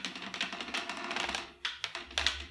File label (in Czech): vrz; Mafie 1